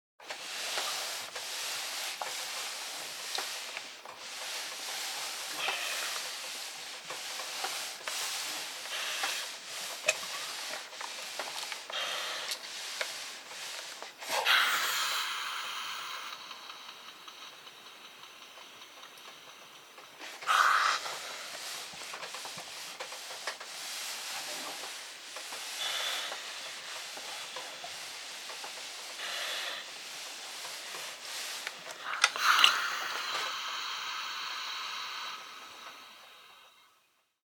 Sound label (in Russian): Utjugom gladyat